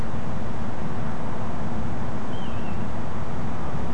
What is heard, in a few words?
Grey Plover, nocturnal flight call; Beijing